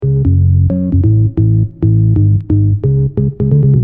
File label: bass